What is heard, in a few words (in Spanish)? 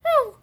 Perrito guau tierno